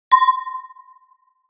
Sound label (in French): verre